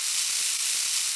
electro

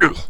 pain; player

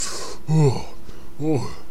gasp; player

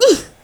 jump; player

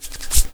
haste

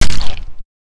gib

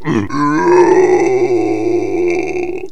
falling; player

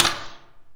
grenade

